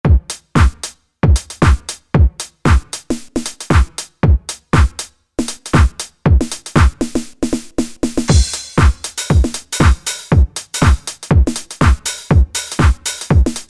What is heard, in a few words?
House Kit, synth